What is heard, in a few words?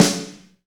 SD Real, Roland; Sound & Vision - Gigapack I